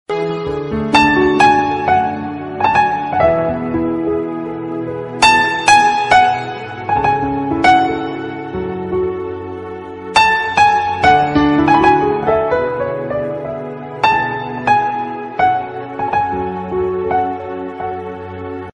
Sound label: relaxing music sad piano violin ringtone